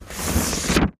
paper fold; paper mvmt

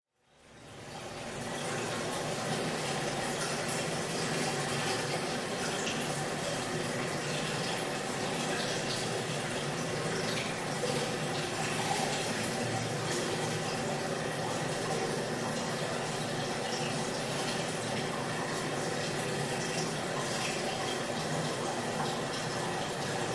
Sewerage Ambience

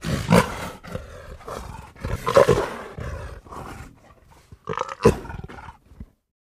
Leopard Gag Throated; big cats

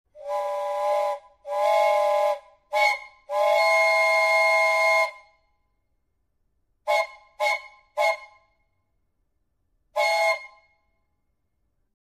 Train Air Whistle; horns

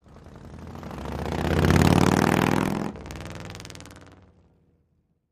Harley driveby

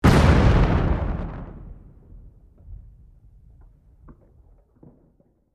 Explosion Rock Debr, rock & sand

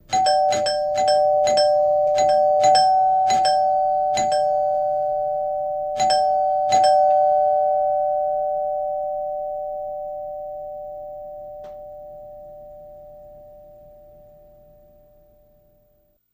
Doorbell Multi Ring

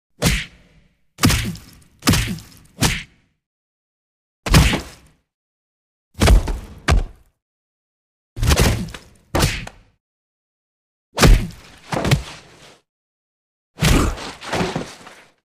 Boxing Juicy Punch

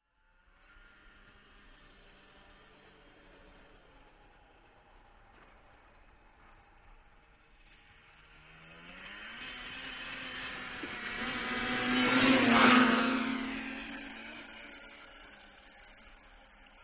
Snowmobile; 550cc Engines By Fast And Long Away.

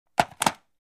Telephone; Hang Up Cell Phone In Cradle.

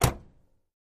Camaro Door Close, chevy camaro

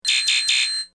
Computer Warning Bee, beeps & tones

